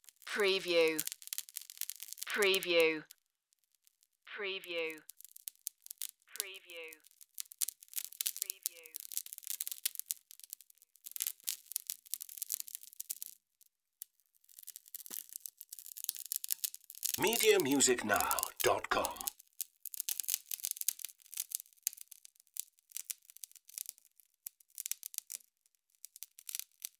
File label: electric sizzle